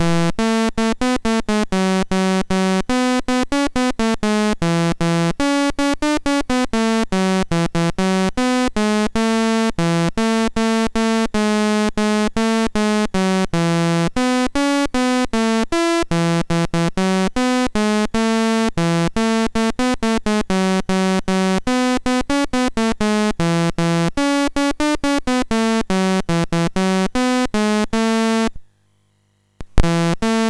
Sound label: Merry Christmas BASIC, MOS6581R3